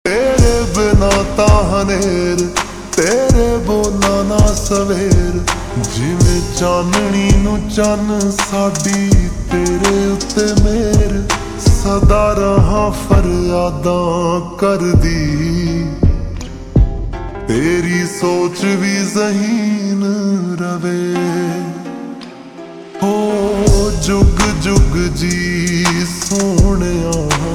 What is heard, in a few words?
Slowed Reverb